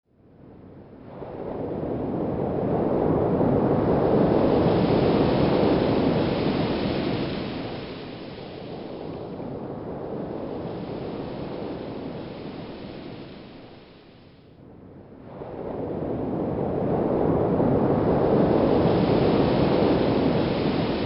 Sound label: Beach Ambience; Sitting By The Shore; The Legend of Mata Nui; Game Music; Console Games